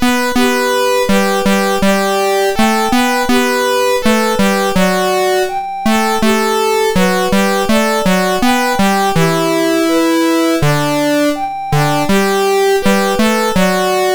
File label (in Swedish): Du Gamla du Fria, MOS6581R2; Mr P